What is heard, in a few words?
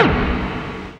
BEND AMBIENC